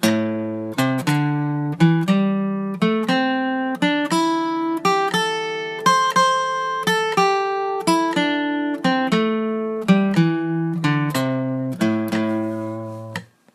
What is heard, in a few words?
Am Penta Dotted 8